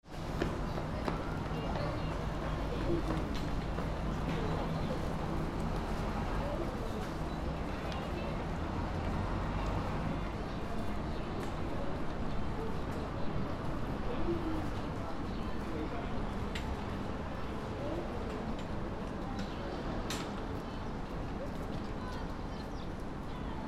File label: fukushima station square